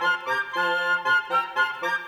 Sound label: Winds, Orchestral